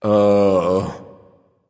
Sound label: ugh; smite